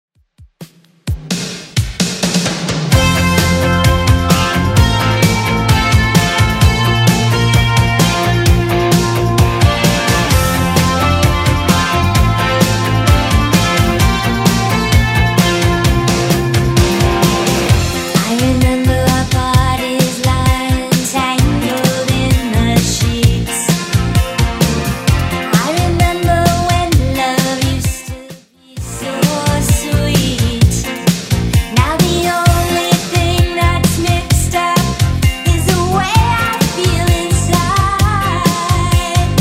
80s Redrum 130bpm

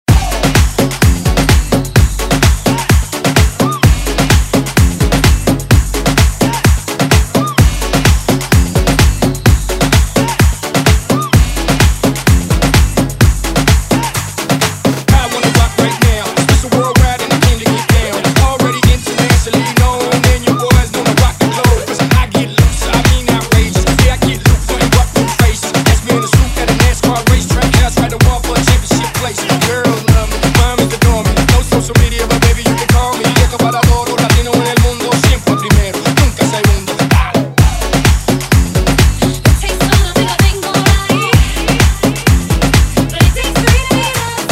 Latin House Redrum

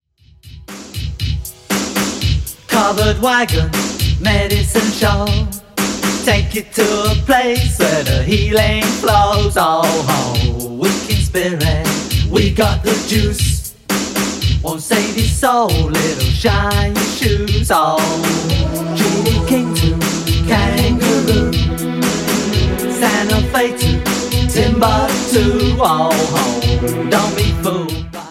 80s Redrum 118bpm

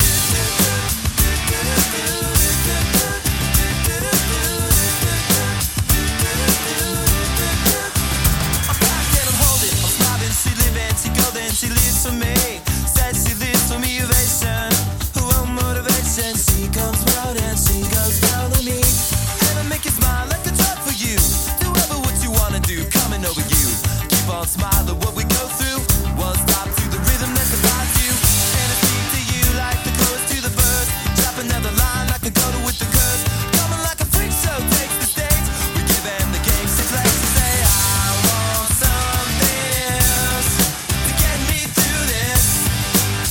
REDRUM 102bpm G

